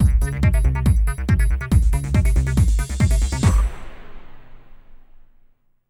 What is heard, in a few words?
Stinger; Black Knight Ghost Level 3